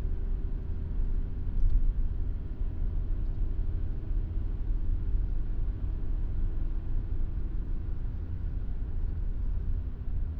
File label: Vectra noise 70 kmh, Car noise; BIN